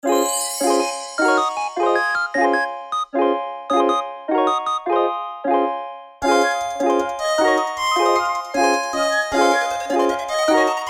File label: Cute AI Future Bass